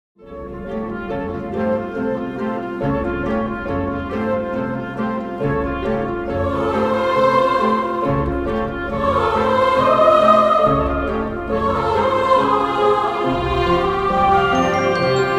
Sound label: Introduction Music; Sacrenuit